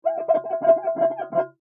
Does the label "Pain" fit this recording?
No